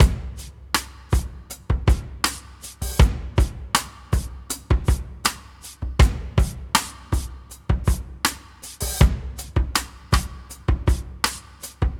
80 Full Drums; Lust; Guitar Hypnosis

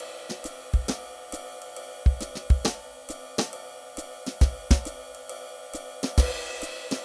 Lounge Jazz Drums, loops

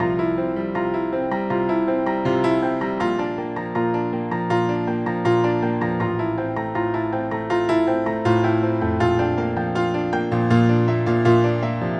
Delicate Piano

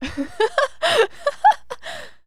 Woman Laugh; laughs-chuckles

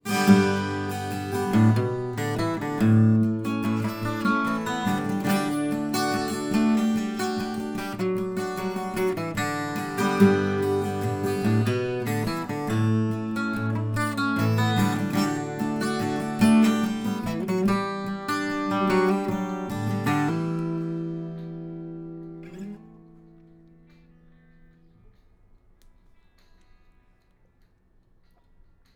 Acoustic Gtr

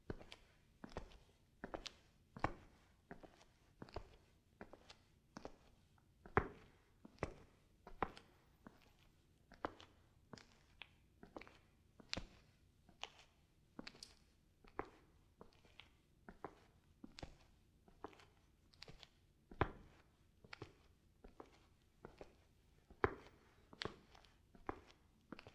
Concrete Shoes Walking; footsteps

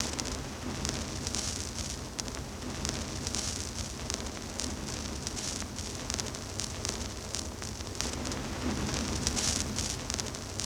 Vinyl Texture; Drums- Downtempo Loops & Samples; Rhombism

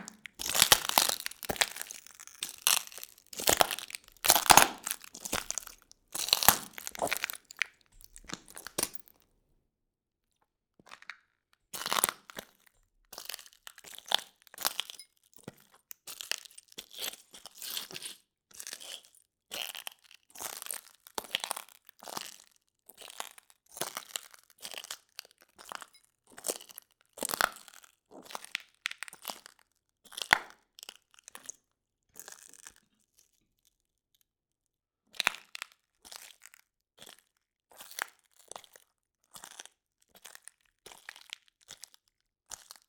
Glass Walking; footsteps

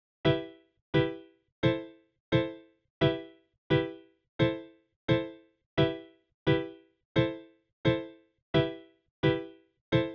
Piano

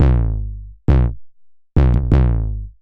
808 Loop BD 85, Roland 808 Drum Loops, 85bpm

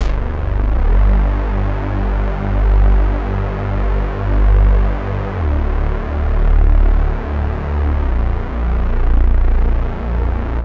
Gm Bass; Drums- Downtempo Loops & Samples; Sanctum

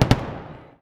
gunshot Double

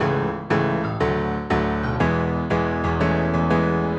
Pop Piano

